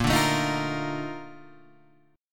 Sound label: A sharp Major 11th A sharp